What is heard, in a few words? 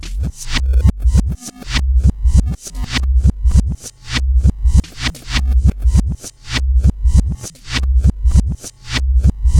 Speaker-Mic Hiphop Loop Rev; Sx Speaker-Mic Hiphop Loop Rev 01